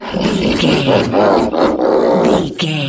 troll monster laugh

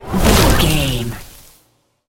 nature spell vines whoosh

